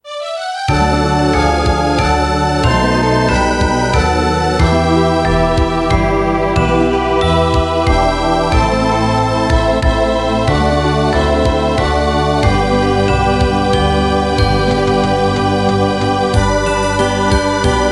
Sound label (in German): kinderlieder leise rieselt der schnee playback